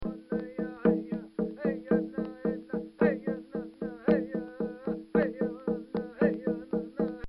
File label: Cherokee War Dance With Chant